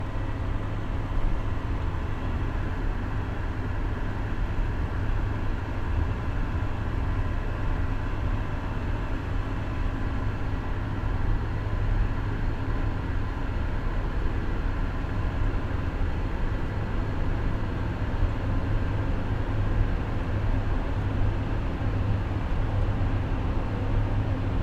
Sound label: AMB, industrial, drone, evolving, noise, churning, machinery, outside; Eiravaein Works; Vaeyan IV